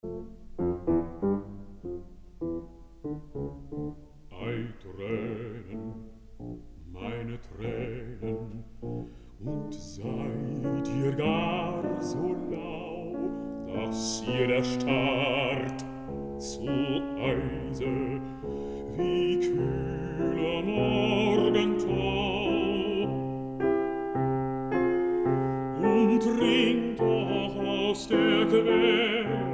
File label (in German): Vokalmusik